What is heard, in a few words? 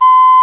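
penny whistle, World Winds; E-MU Producer Series Vol. 7 – Old World Instruments; World Combos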